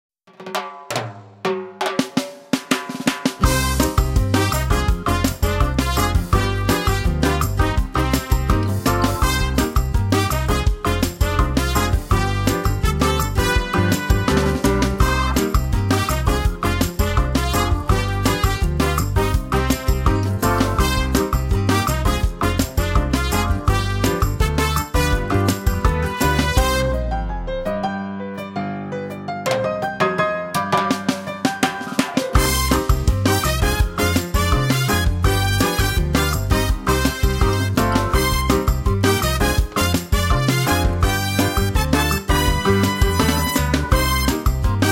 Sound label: OPENING INSTRUMENTAL